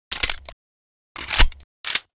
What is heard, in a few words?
glock reload; STALKER